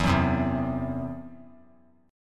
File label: E Suspended 2nd Sharp 5th, Esus2#5 chord; strummed